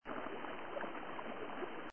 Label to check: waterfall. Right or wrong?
Right